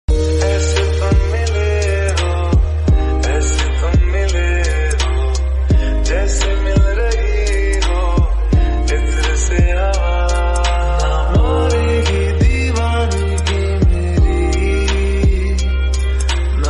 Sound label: remix ringtone bgm